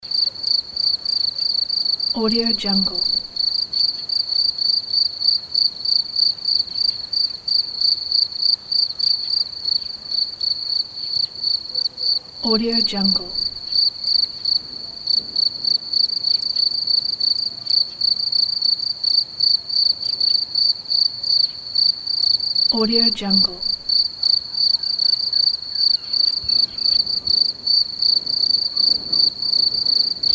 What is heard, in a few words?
cicada loop; soundfoodsfx